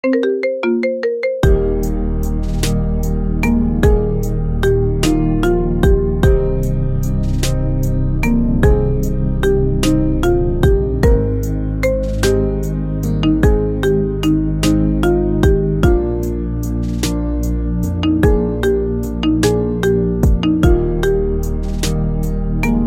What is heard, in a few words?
Marimba Remix